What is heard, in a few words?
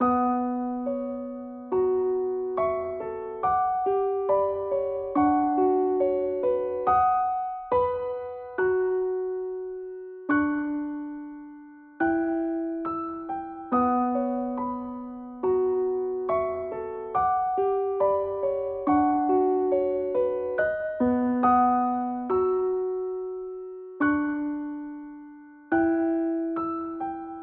Piano Loop Dark Lullaby